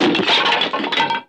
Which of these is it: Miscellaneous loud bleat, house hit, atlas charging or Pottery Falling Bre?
Pottery Falling Bre